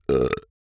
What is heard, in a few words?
burp; OPENAIR; Anechoic